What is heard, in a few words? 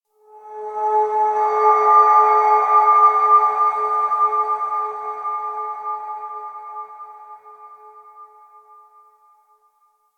Singing Bowl; Warframe; Ambience; Clan Dojo; Dojo Randoms